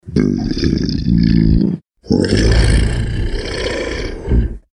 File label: deep creature growl